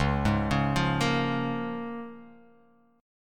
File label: C sharp 6th C sharp down